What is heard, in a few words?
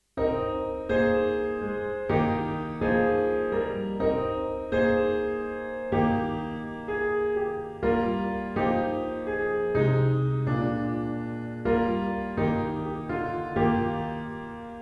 piano pop